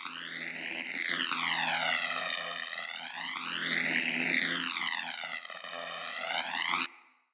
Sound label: Voltaic Sword Projectile Loop; Characters; Enemy; Abilities; Sinecure; Banneret